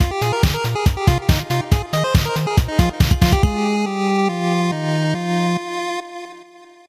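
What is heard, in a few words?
music victory; Oliver's Adventures in the Fairyland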